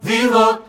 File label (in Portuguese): logotipo sonoro; vivo